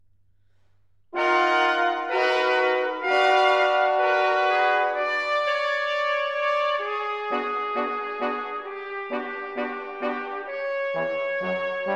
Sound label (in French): quatuor Cuivres